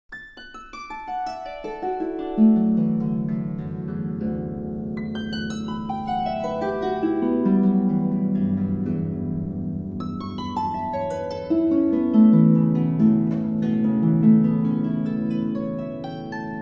While Shepherds Watched Their Flocks, Christmas carols on the harp